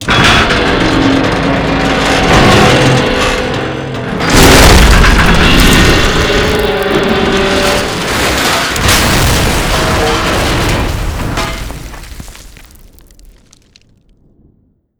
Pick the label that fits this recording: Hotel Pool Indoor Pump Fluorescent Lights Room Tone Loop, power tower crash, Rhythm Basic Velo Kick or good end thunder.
power tower crash